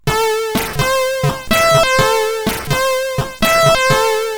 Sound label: Jingles, CSG8580R5